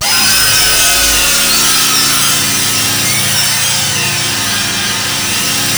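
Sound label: A3 orchestra; Northstar; The Wizard VOL-1; SCORPION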